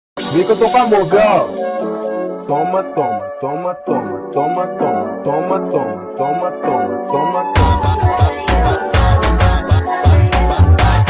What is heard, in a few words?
FUNK SLOWED + REVERB; TIKTOK VERSION; BLUE LOCK